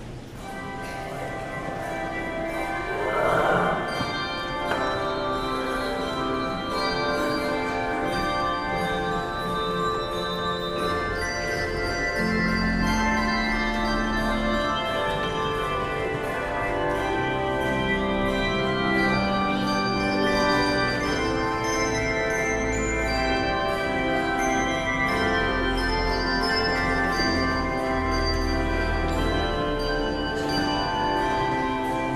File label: Handbells Rondo Passacaglia; Dobrinski